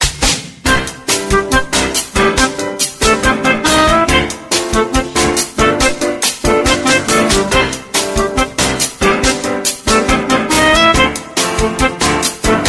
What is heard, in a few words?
Rhythmical Bossa, phone tones; LG A290